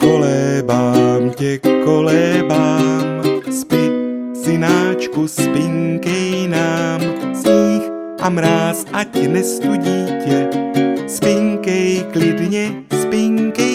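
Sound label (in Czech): ukolebavka, lullaby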